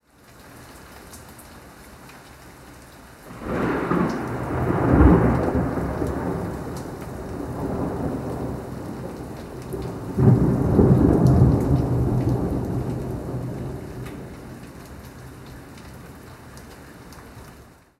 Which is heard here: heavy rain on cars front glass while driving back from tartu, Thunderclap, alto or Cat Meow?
Thunderclap